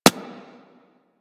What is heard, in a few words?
Bullet Impact Generic; Surface